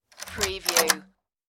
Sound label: apartment door unlock